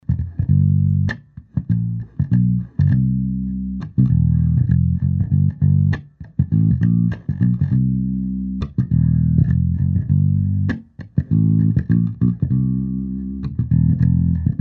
Bass I5, amp

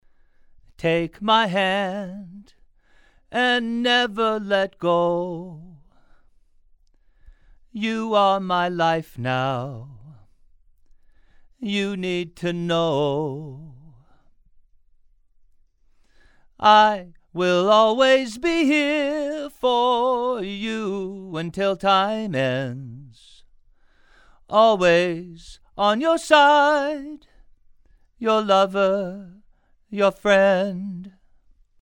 Male Vocals R122

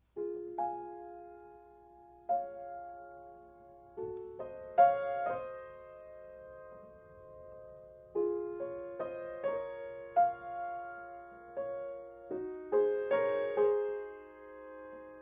Piano

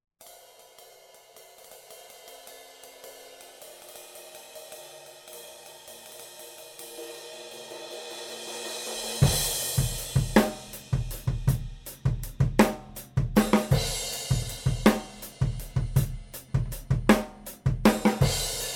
Drum Overheads Clarion

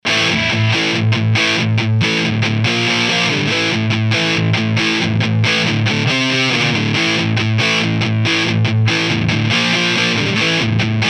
AMP DIst

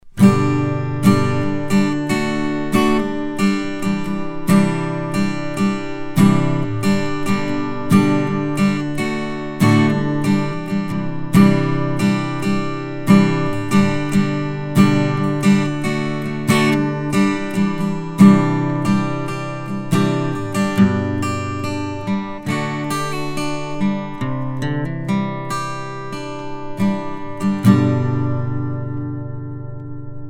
Acoustic Guitar Gemini 5 Tube; SE Electronics

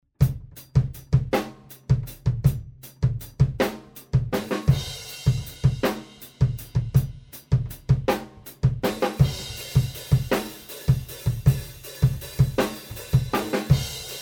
Room Mics V2

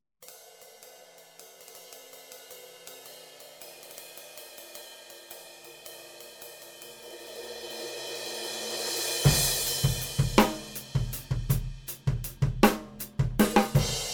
Drum Overheads; SE Electronics Gemini II

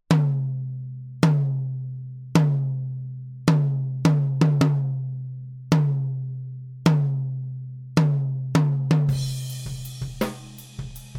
Tom Microphone 2200 T

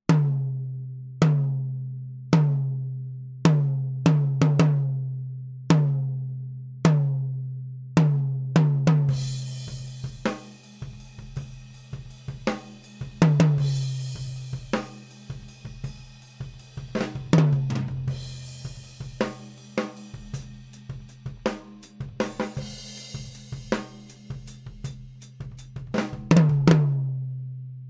Tom Microphone AT4050

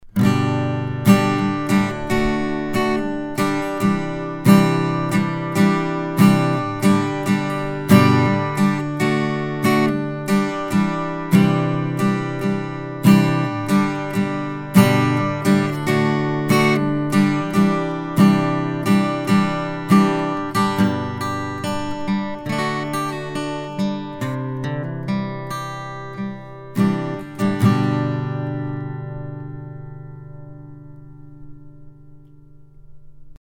Acoustic Guitar SCX1; mic shootout

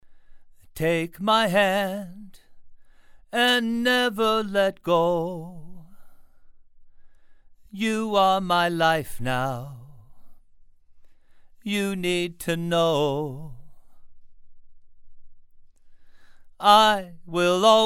Male Vocals; Horizon

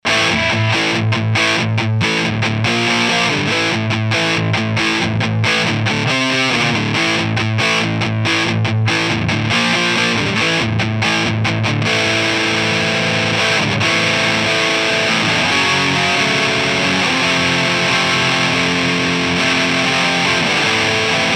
AMP DIst

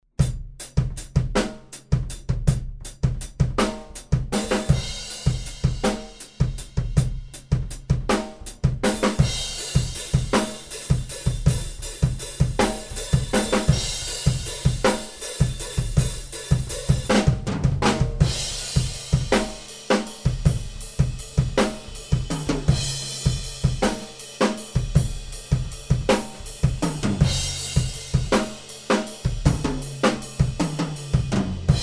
Room Mics Gemini 5 Tube

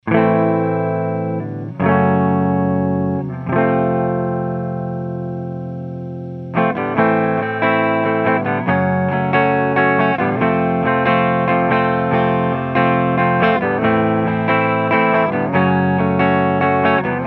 AMP Clean C212, clean eguitar

